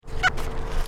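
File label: Rana pipiens release short, frogs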